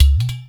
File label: UDU; InVision Interactive; Percussion Slam